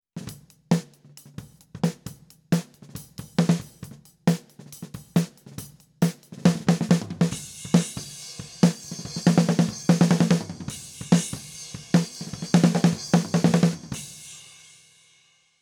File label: kick distance tunnel